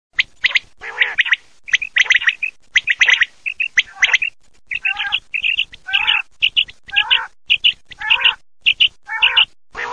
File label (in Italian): QUAGLIA